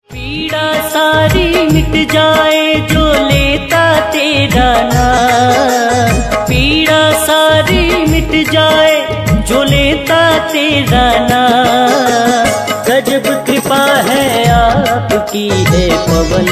Hanumaan Ringtone, Bhakti ringtone, Hindi ringtone